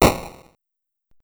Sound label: short explosion; mixkit